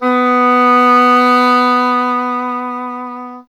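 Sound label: English horn; Miroslav Vitous - Solo Instruments VOL-2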